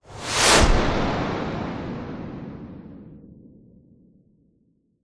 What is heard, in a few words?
missile small launch designed